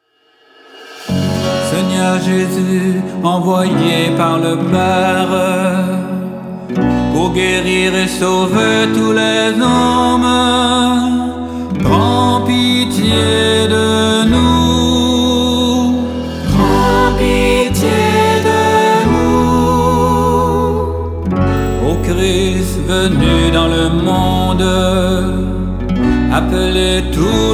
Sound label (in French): acte penitentiel; celebration eucharistique suggestions de chants